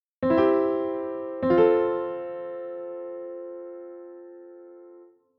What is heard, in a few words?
chord progress c f